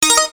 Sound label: Ding